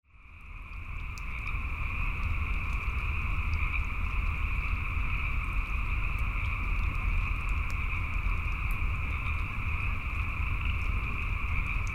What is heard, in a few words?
frogs